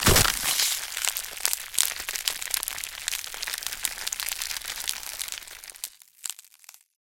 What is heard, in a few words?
stretch, glue; infestation